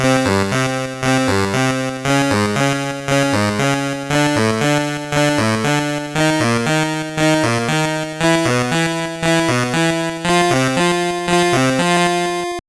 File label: Ditty; NBA In the Zone 2000; GBC